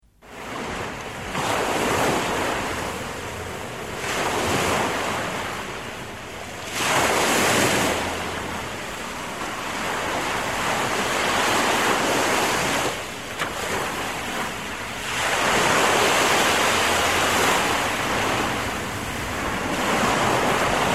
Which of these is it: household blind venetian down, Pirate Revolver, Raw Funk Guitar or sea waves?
sea waves